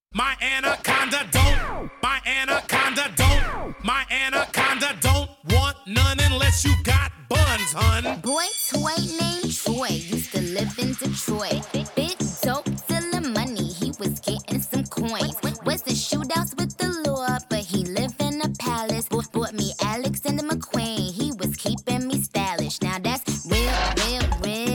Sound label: Hip Hop; Audition